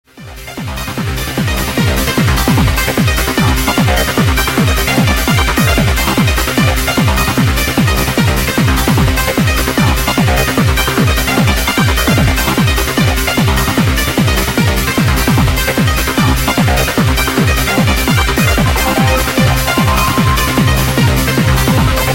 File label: Oscillator Feedback Algorhythm